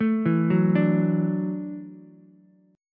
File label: E 7th Suspended 2nd Suspended 4th, down, Ukulele; E7sus2sus4 arpeggiated; JGuitar